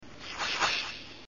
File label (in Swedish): Svisch